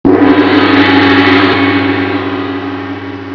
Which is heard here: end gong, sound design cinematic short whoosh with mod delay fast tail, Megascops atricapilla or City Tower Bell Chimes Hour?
end gong